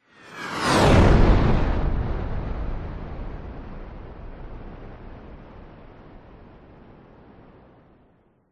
airplane fighter jet pass by low close extreme fast speed; vehicles; transport; whoosh